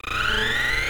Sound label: warp; Chaseace; 501 Great Games - Volume One; Guildhall Leisure Services